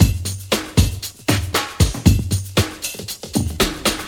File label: 117 bpm classic breakbeat sample g sharp key